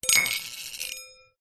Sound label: foley metal small dish drag scrape concrete